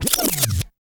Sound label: TAPE STOP, DJ TOOLS; Drums In Your FACE!